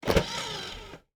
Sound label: Eject, DVD Drive Internal; DVD Drives